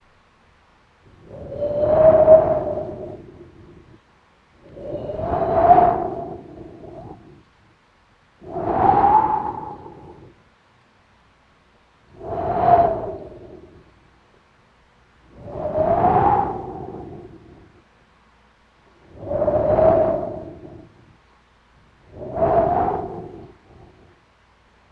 Whisper Of Wind

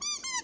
Squeaky Toy

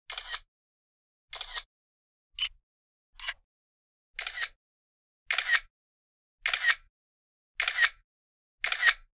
Camera Shutter Clicks

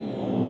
Stretch

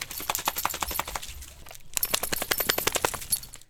Wet Shaking Dog

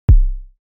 Kick Drum C0

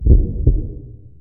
Heartbeat Timbre S Variant Loop

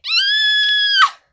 Girl Folk Scream

